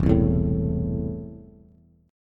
E Suspended 4th Sharp 5th, Bass; strummed; JGuitar

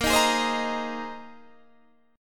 Bb 9th Suspended 4th Bb down Guitar Open D

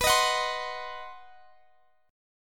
C Minor Major 7th Flat 5th B, chord

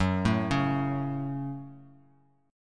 F sharp Minor; arpeggiated; x,9,7,6

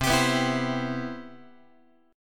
C Major 7th, Guitar; CM7 strummed; JGuitar

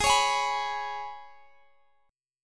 Ab Minor Double Flat 5th, Guitar, Half Step Down

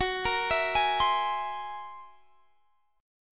Gb 9th Flat 5th Gb down, chord; Gb9b5; arpeggiated; JGuitar

